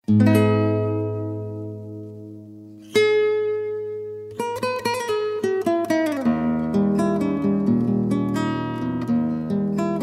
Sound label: High Low Spanish Dance